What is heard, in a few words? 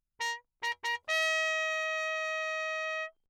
TRP MUTE Bach signal; Perinétventiltrompete